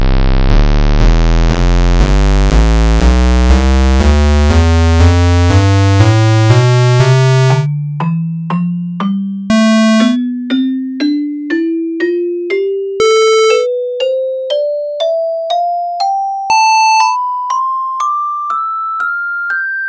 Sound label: crystal tonleiter